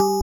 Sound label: BELL; osu